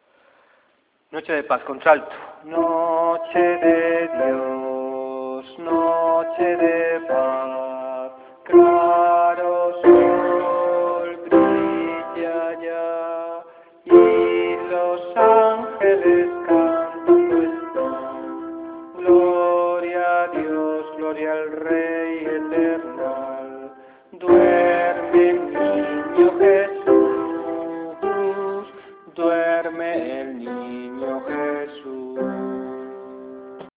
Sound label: alto